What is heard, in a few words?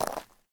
gravel, footsteps